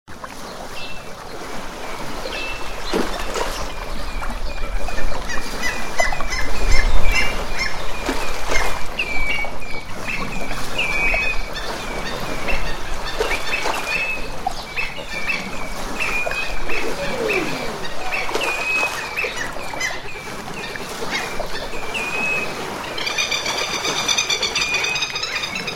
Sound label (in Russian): shum morja